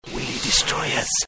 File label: whisper